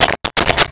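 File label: Rotary Telephone Check for Dial Tone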